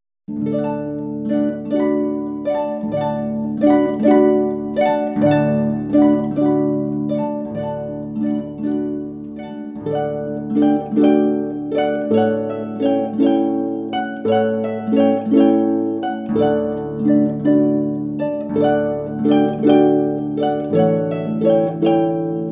Barcarolle; Offenbach

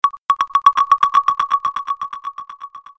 beats

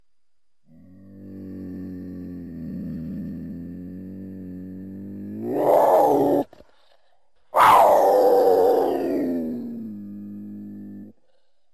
Lionroar

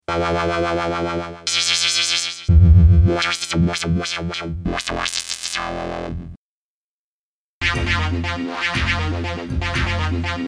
Synth EML Wobbler

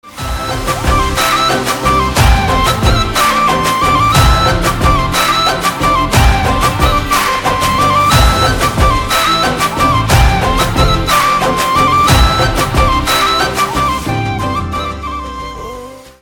Instrumental

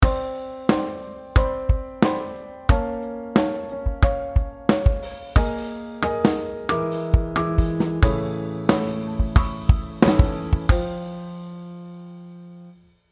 metric mod; Tempo can also be modulated just as you can modulate to a different tonality.

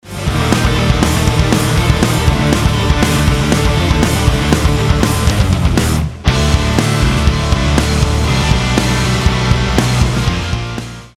tempo contrast

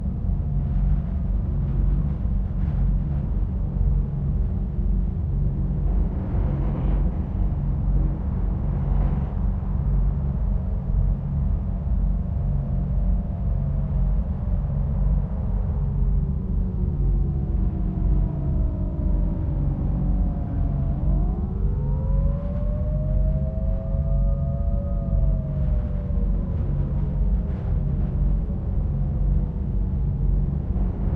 balcony

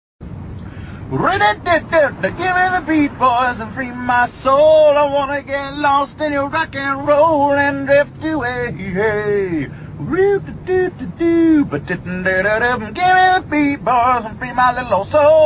singing, Ringtone; The Office

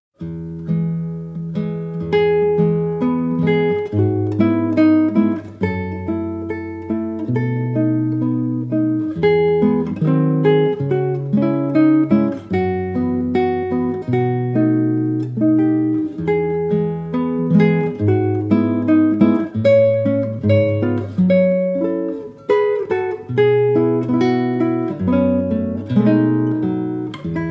Salut D'Amour; Elgar

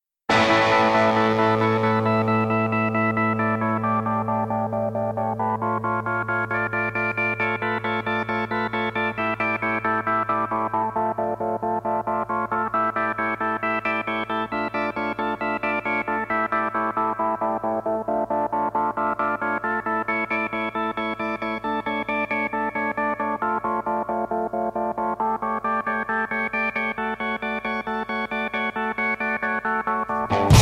Organ

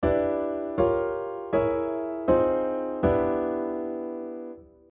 block chords

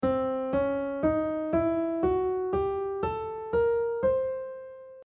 half-whole diminished

